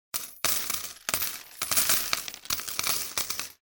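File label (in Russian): монеты сыплются непрерывно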